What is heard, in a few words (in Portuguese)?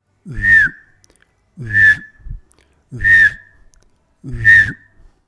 assobiando como o tucano; pica-pau; Pia o pio… Os pássaros e as árvores que fazem parte da vida do Martim